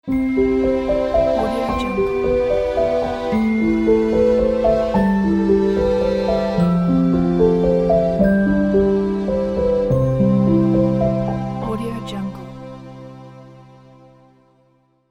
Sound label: intro logo soft christmas; domansed